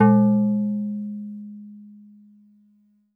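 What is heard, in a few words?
Steel Drums DX100 G#5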